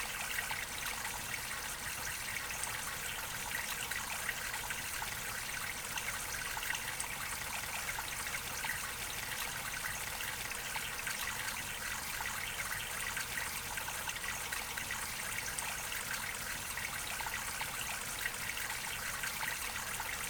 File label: brook